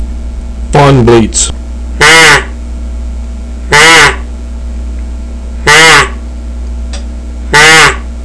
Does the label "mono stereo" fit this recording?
No